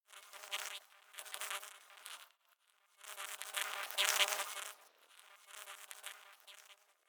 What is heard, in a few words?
Insects; we are the dwarves gamerip 2016